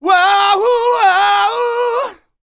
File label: R ROCK, MALE HUMAN; R&B & Dance; ILIO; Vocal Planet